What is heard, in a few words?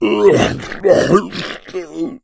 boomer shoved, hit; player; source engine; left 4 dead 2